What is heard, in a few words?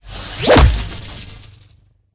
Electric pound